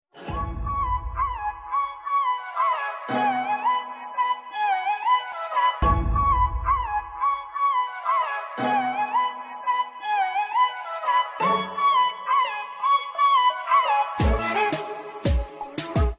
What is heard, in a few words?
solo ringtone